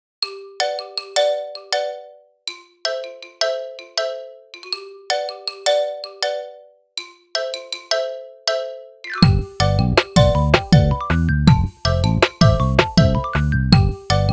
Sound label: Dynamic, ringtones